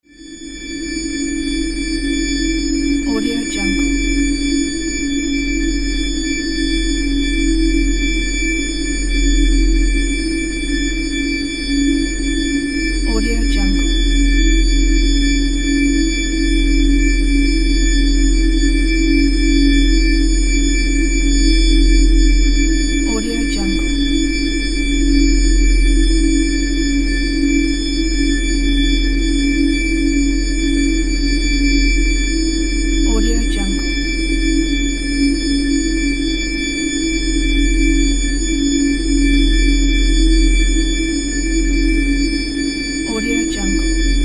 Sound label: drone bell